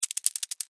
Spider Run Stop, Scurry, Movement; Animal, Spider, Run, Stop, Short, Scurry, Movement, Arachnid, Insect, Bug

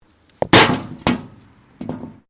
debris; Material components for constructing more complex sounds; Materials

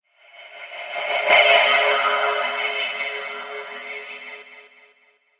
Reverse Cymbal Whoosh

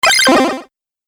Retro Random Bleep Accent

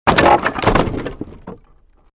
created wooden barrier smash medium

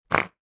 Fast Fart; Farting sound; Fart (Sound Effects:Human)

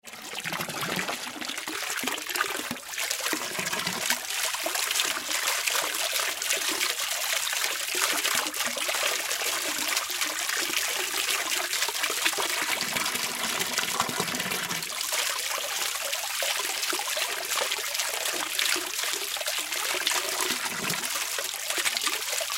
Water Fill Pool With Hose Short; Spraying; Objects; Blastwave

